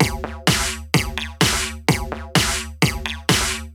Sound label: Melody Kits 128BPM Root D; Vengeance Samples